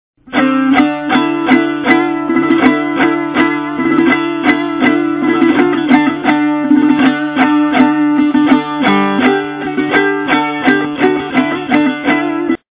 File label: tanbur